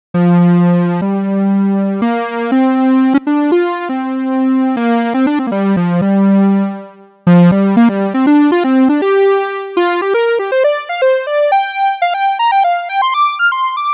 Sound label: OB E Lead Sound mono no FX